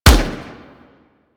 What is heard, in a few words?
Fire Shot; Warframe; Weapons; Burston